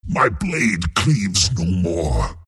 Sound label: death; Cent